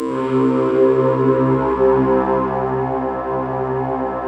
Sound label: Microwave; Optical Media International; Sonic Images Library; All Micros